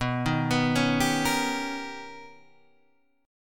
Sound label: B Augmented Major 9th B, chord